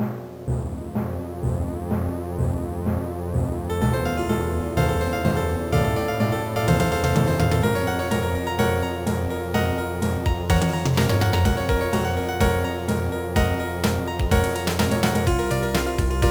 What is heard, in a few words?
Piano Session; MusicMaker V8